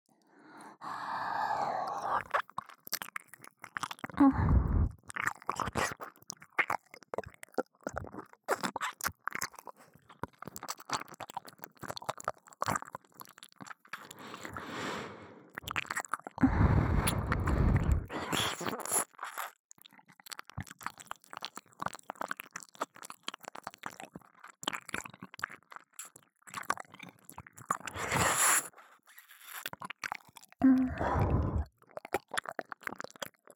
左耳舐め, ASMR

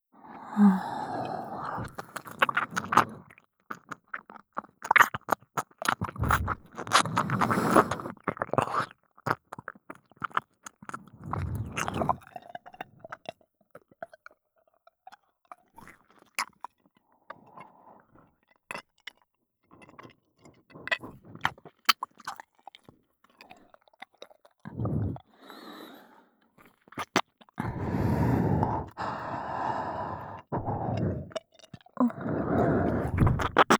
左耳舐め, ASMR

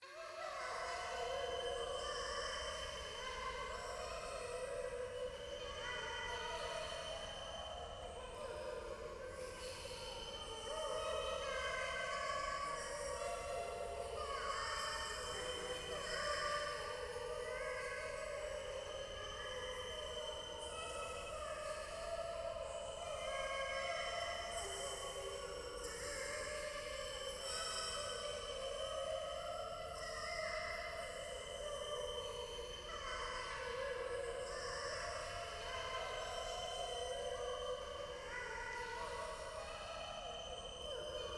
ゴースト 可愛い喘ぎ 山奥

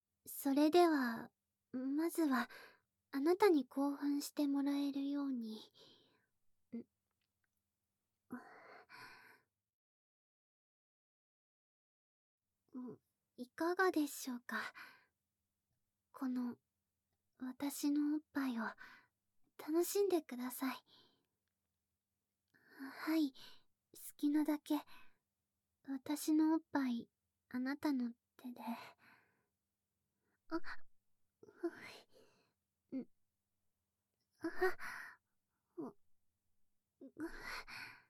耳舐めペッティング！, ASMR; SEなし; 身体を慣れさせ育成準備

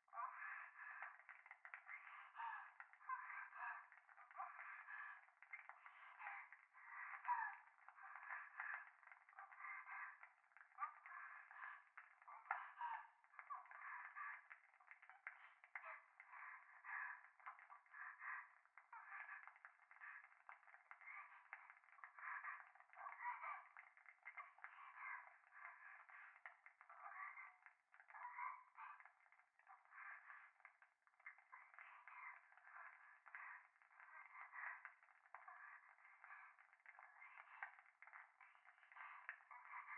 風呂 右耳密着処女喘ぎ 暖炉

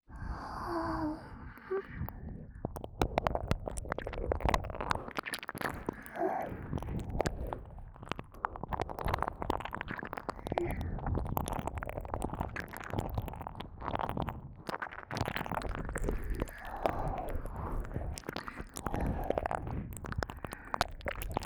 耳舐め 両耳 ループ

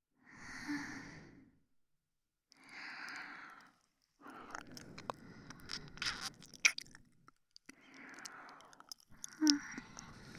耳舐め, ASMR; SEなし

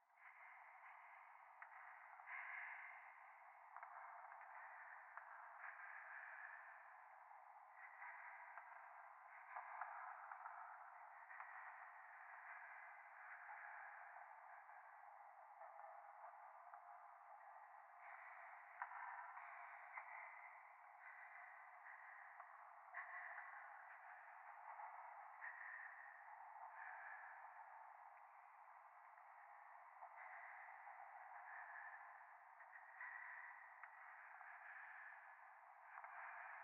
左耳快感吐息 電車

